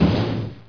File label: duct, player; mistake coop